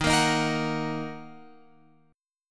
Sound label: D sharp Minor, Guitar, Open F; strummed; JGuitar